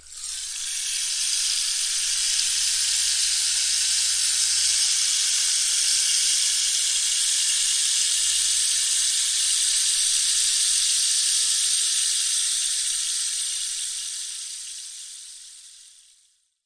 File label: rainstick performance; ETHNIC and WORLD PERCUSSION; Rainsticks